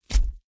FLESH CHOP, gore

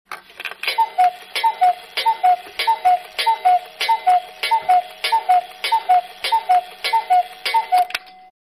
Cuckoo Clock, 12 Cuckoos, Has Door Movement

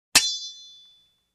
Single Sword Hit

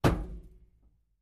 Hollow Metal Hits Lot, barrels buckets cans

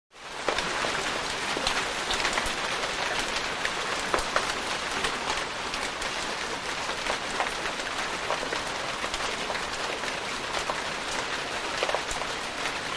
Rain Heavy On Plants

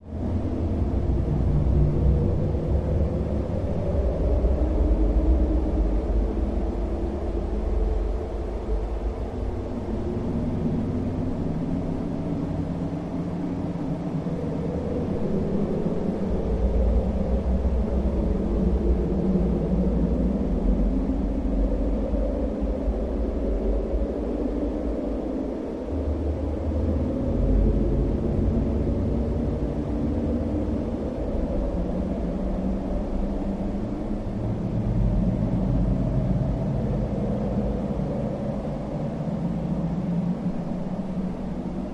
Energy Field Low Pitch; air

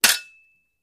Fencing Clanks Singl, swords

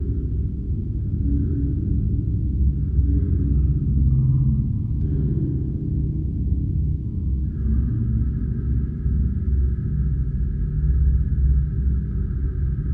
Space Amb BG Calm, sci-fi ambience

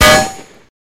Bullet Impacts Clan, metal

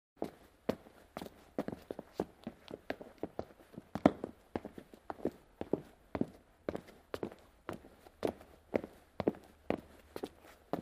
Footsteps On Linoleu, tile

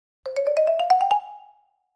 Xylophone Shrt Ascen, mallets xylophone

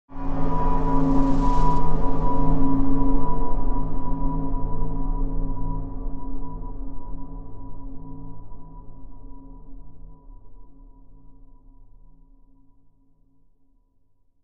Bed Alien Encounter

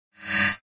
Mouse Over HF Elect, mouse over

